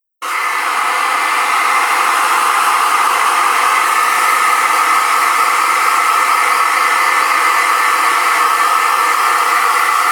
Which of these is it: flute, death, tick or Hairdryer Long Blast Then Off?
Hairdryer Long Blast Then Off